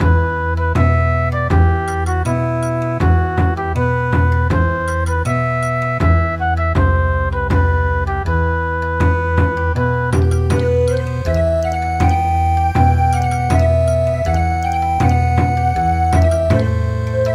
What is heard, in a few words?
Bon Odori; Danchone Bushi